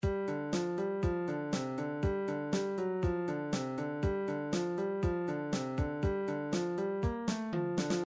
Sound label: drums motown